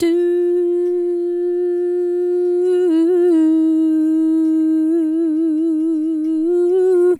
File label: Female Solo, Hip-Hop and RnB Vocals